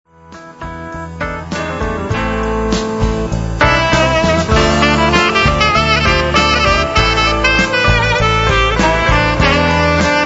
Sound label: sax